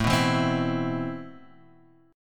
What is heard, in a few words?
C Major 7th, A, guitar chord; Cmaj7/A strummed; JGuitar